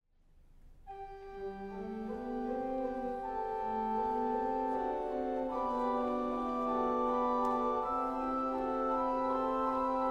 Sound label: Kyrie Gott Vater in Ewigkeit, orgel; J.S.Bach; BWV 672